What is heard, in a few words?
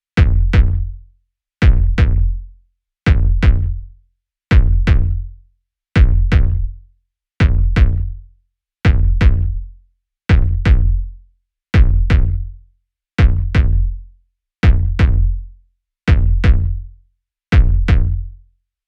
Loop Kick Subvert; Get Even; City Night Offices